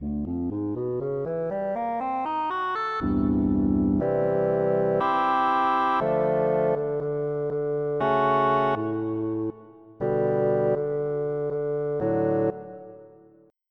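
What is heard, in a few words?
Bassoon; FF7DEMO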